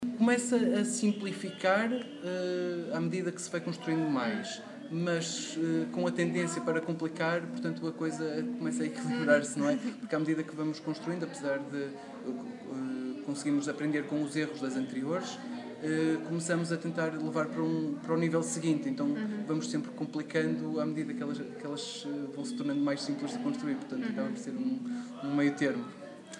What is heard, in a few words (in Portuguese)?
guitarras